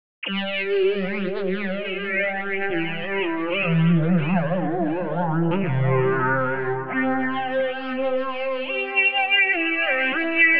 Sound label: Synth Master